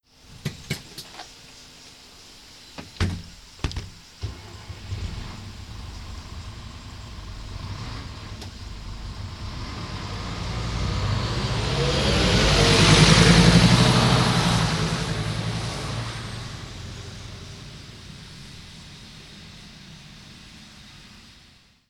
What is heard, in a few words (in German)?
Bus; Audiodateien für Leserinnen und Leser des Grundschulmagazins; geraeuschebingo